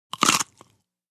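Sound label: Single Short Bite Carrot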